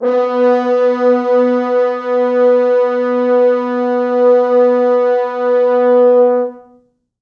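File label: 4 FrHorns SUS, French Horns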